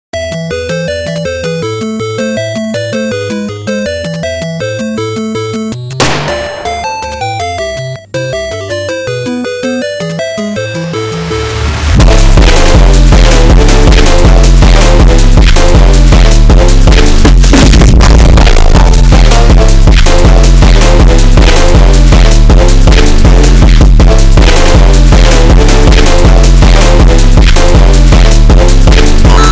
Tetris Phonk

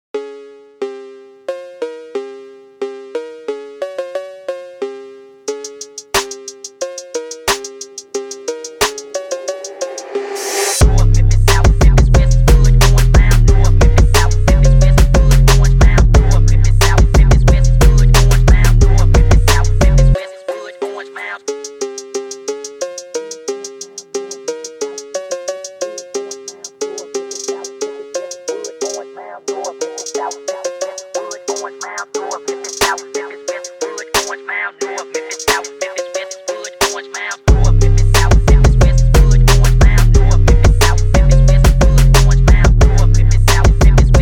PHONK

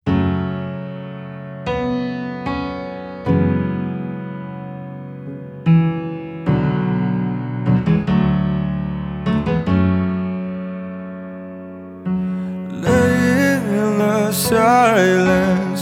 Acoustic

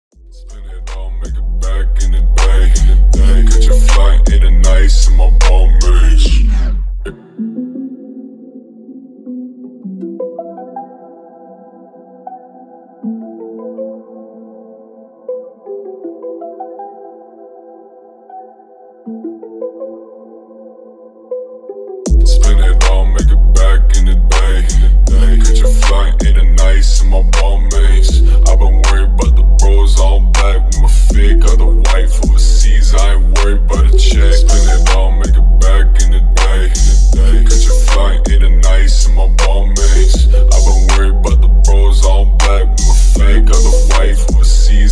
41-44-46Hz Screwed Low Bass

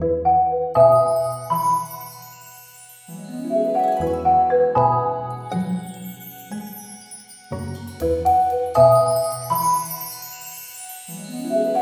Cats Marimba Mix

